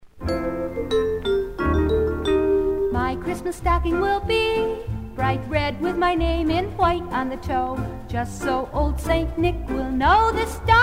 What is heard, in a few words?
Sleigh Ride Jingle Bells